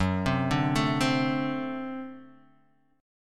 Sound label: D 7th F sharp, guitar